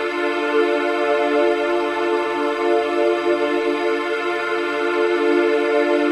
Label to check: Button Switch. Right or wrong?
Wrong